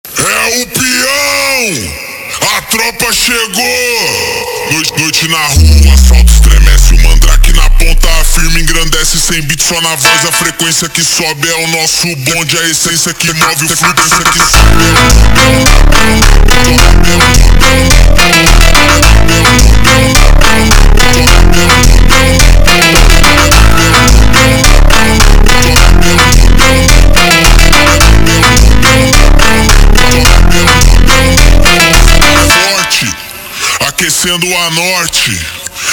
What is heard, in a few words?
Montagem slowed